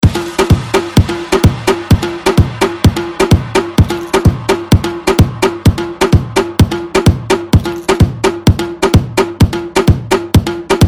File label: Party Mush